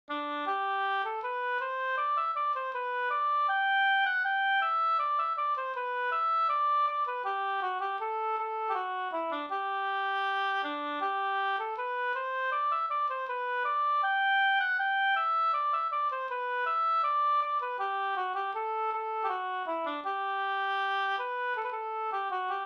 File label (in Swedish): brudmarsch